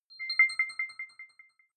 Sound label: button echo resonance arpeggio; signale